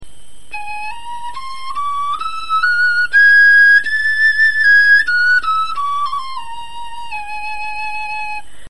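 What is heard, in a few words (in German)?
tonleiter, Knochenflöten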